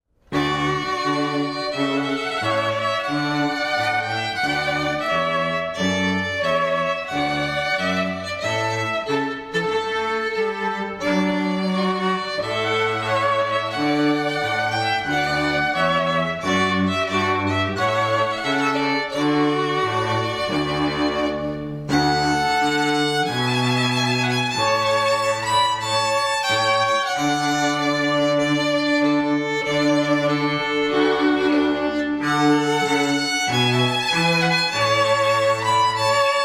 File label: Trumpet Voluntary TRIO